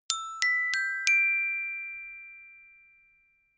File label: notification glockenspiel dry sequence jump